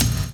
BD; Best Service; Dance Mega Drums

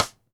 Ludwig Supraphonic Snare Cross Stick; 1965 Ludwig Supraphonic Snare One-Shots; Sonic Foundry (Sony Creative Software) - Vital Drums

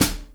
HH, 180 BPM; Ueberschall; Drum & Bass Flash Trackz

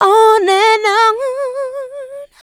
Vocals, Adlibs; Houseworx

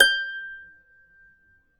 G5 HARP; USB Soundscan vol.62; AKAI

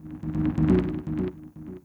synth, 129 Cmin; Big Fish Audio; Hardcore Breakbeat Action

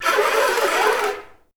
FL FX, Advanced Orchestra

